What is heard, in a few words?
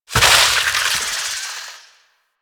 Loot Tree Open Burst; Ambience; Warframe; Infested Eris